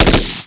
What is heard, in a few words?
m16 burst; specops